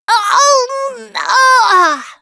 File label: choke, russian, Survivor Voice Producer